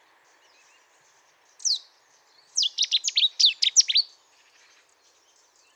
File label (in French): Bouscarle de Cetti Cettia cetti Chant typique